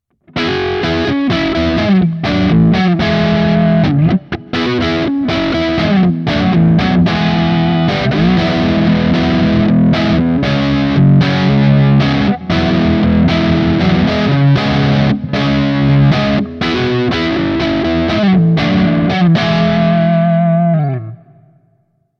Hagstrom Ultra Max Neck Highgain Rhythm

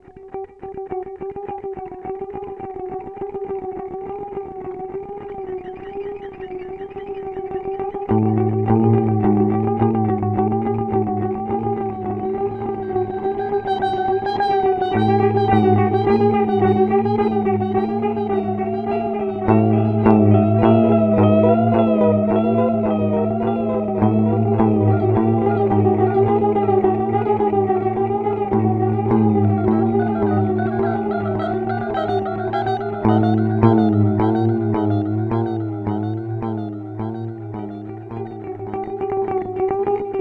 Radical Delay Mod; Alexander Pedals

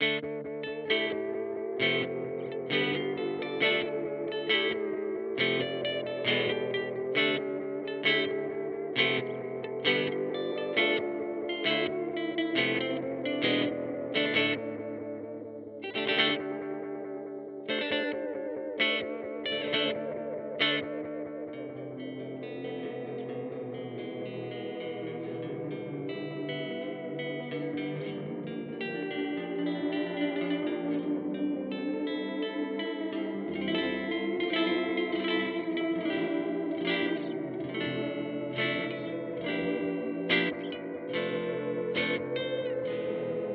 Fairfield Circuitry Meet Maude Modulation

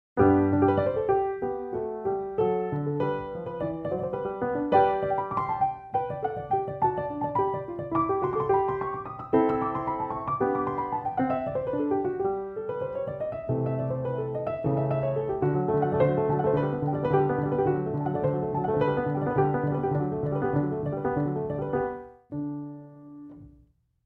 Grand

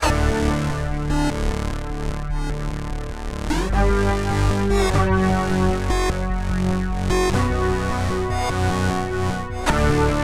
Boss SY-1000 Preset

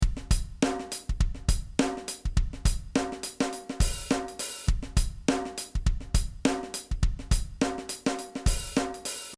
Loop Dry

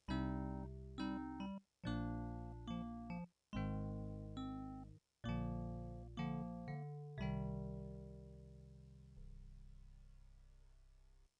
DigiPiano; Boehm

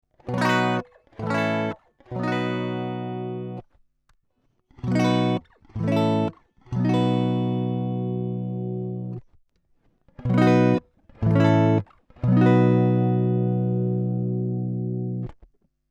DI Jazzmaster 35dB Gain; RME UFX

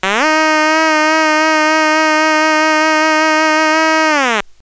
Otamatone Deluxe Vibrato

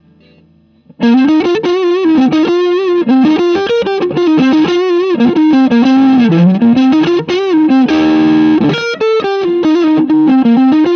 High Gain

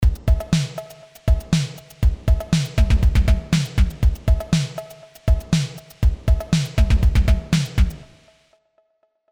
CMI V Beat Rev LX-24 Ramped Percussion Plate

